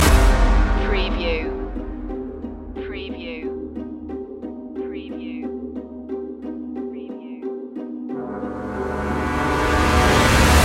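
cluster trailer loop, Orchestral Soundtrack Suspense / Tension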